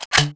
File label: Grenade Select